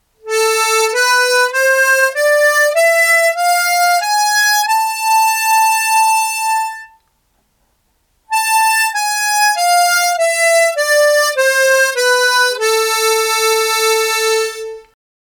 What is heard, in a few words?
harmonic minor scale